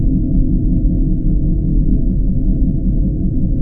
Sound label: forcefield